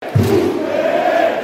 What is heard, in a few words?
boom eee